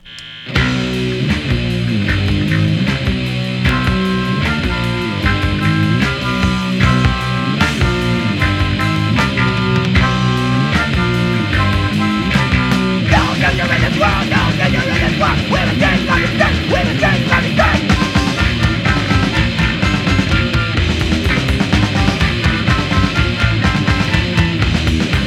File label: harmonica song